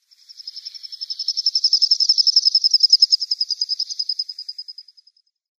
Hooded Merganser flightcall

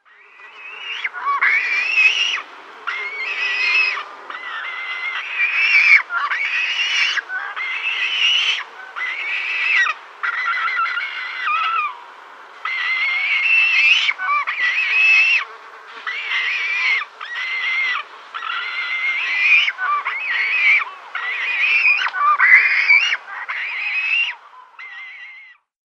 European Shag nestlings

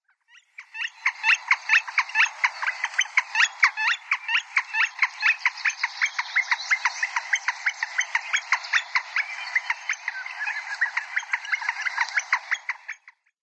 Northern Flicker call

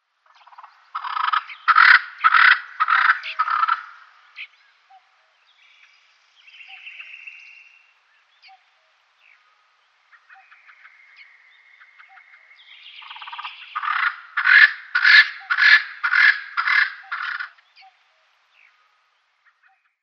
Double-spurred Francolin male display

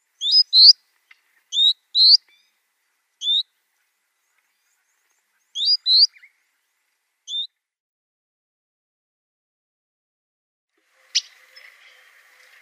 Red-billed Firefinch calls

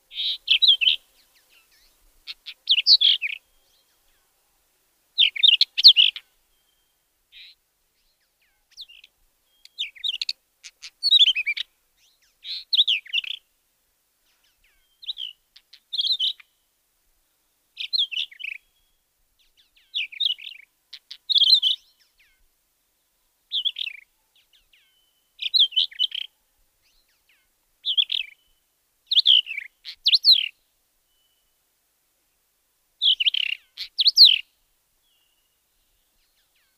Persian Wheatear song

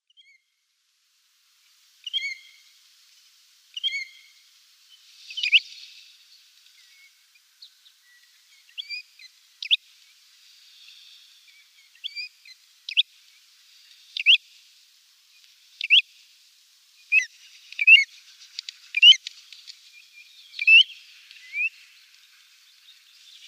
Pacific Golden-Plover calls

